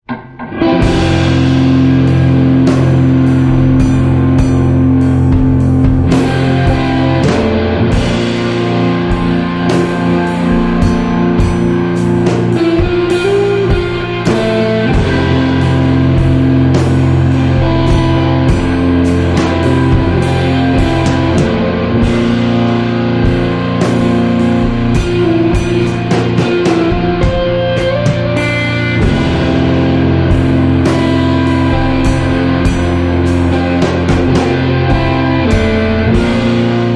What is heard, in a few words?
Rain Song